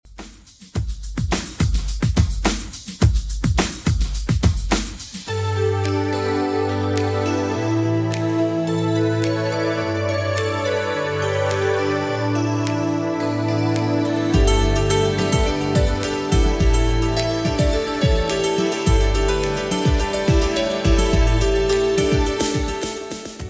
90s 106bpm; Redrum

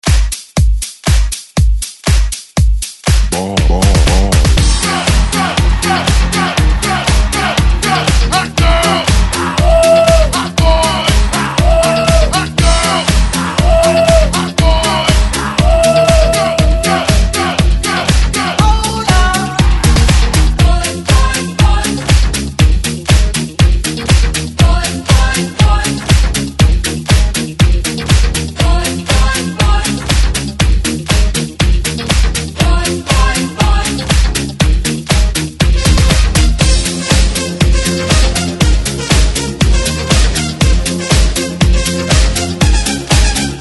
Throwback Hype 120 Clean; DJDOLLS

